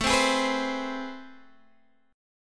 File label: A Minor Major 9th, down, Guitar, Open D